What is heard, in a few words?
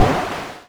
rocket